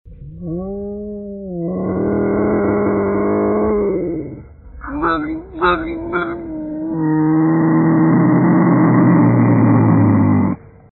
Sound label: ris lynx lynx; zvuki prirodi